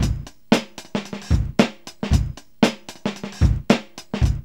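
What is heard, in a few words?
114 bpm, Funky loops; Zero-G; Funky Elements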